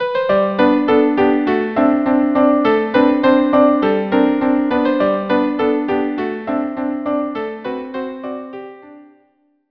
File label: ecossaise viola duo